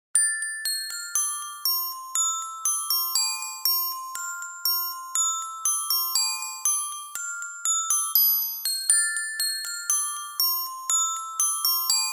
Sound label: small Glockenspiel